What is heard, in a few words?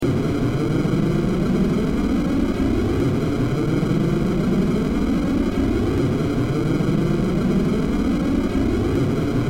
rocket main; grade3; layers of earth